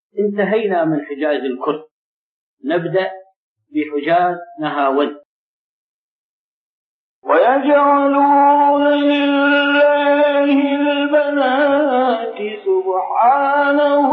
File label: hijaz nahawand